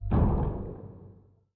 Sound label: Menu Inspector Window Open; Custom UI Sounds; Dying Light